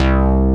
SELECTED BASS; Masterbits; Dance Trax

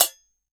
BO HIT; INIS - Opium; PERCS-KIT

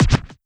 Percs, Elektron MachineDrum

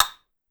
Woodblock, Clavia DDrum-AT, Pitched Down

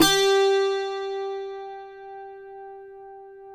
CUATRO; Spectrasonics; Hans Zimmer Guitars VOL-2

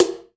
SN REV, AMBIENCE KIT; AKAI S6000 CD-ROM - Volume 1; DRUM RYTHM

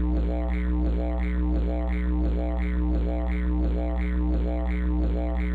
DIGERDO, Drum Loop, Yamaha DTXpress

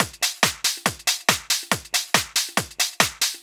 Addon Loop, Drum Loops 140bpm, Perc Loops

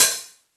hat open high live, Chicago House Drum Hits

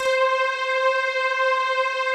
PLUCKER KEYS, pads; Pro-Rec - Synthsonic; TR PADS

